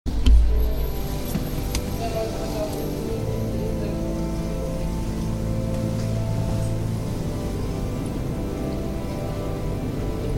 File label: organ practice in the church next door; observantenkirche munster germany